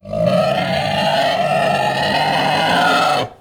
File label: scream; Rust